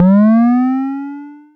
Synthe Drum, Daytone Drum Synthe RDS

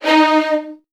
D#1, VN 16TH, Virtuoso Strings; ILIO; Kirk Hunter

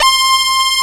SPACE WAVE

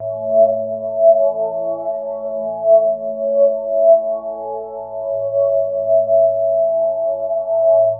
Guitar Textures

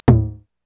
TOM, Korg EMX-1 drum machine

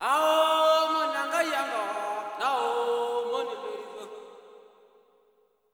SOLO G#, Heart of Africa; Spectrasonics; Heart of Africa 2

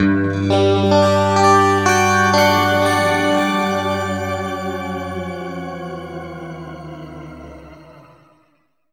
G P-S, guitar chords; East West; Steve Stevens Guitar Samples Collection

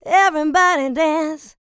LICKS, Vocal R&B & Dance; ILIO; Vocal Planet

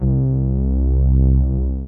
TWO CELLI, poly 800; TECHNO-BWARE

